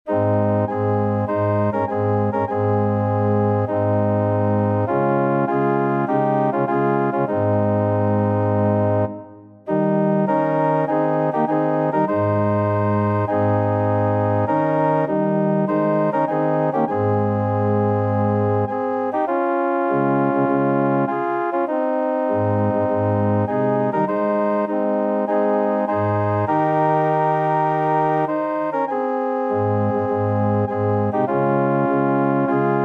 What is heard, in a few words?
organ